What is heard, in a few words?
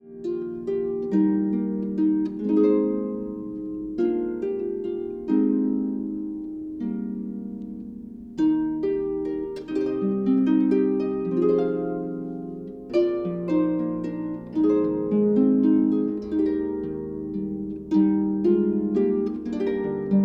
Harp Selections